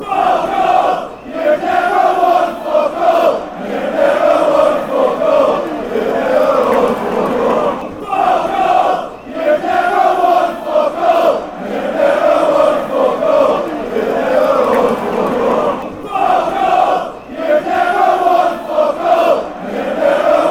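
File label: youve never won fck all, football chant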